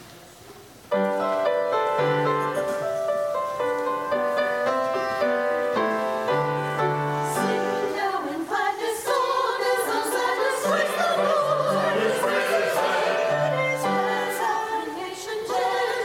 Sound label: Easter Sunday Special Music Choir